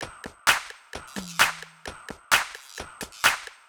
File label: Drum Loop 130, Downtown House; Drum Loops 130bpm